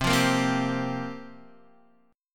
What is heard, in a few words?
C 7th Suspended 4th, Guitar, Standard; C7sus4 strummed